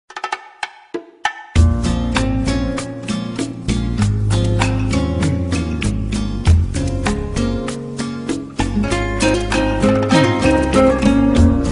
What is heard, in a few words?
Ballroom Rumba